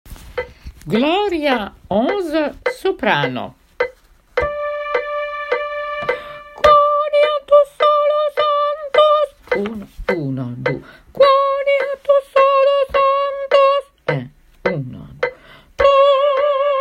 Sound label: soprano; Gloria de Vivaldi